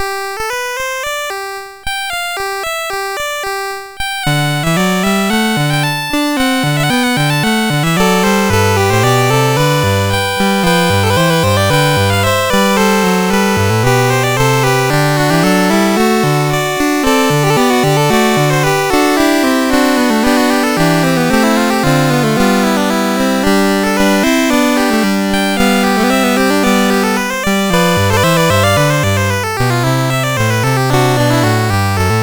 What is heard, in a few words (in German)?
Fuge, MOS6581; MOS6581R4